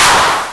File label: Clap; Vengeance Samples; VEC1 Claps